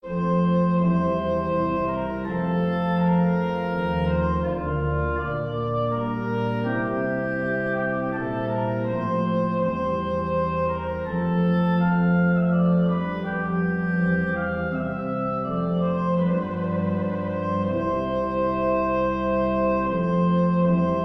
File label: Sarabande in F